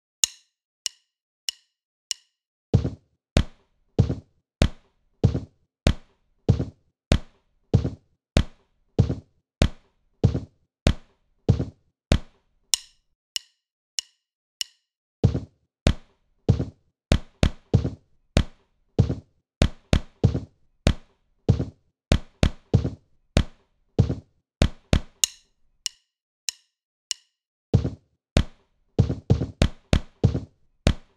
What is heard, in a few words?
body perc